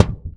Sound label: Whack, Kicks; Airwindows